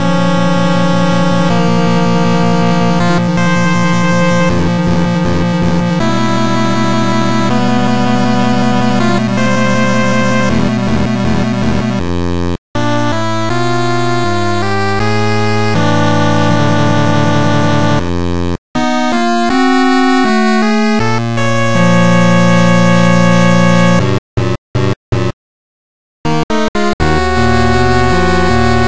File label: 8bit; maou toride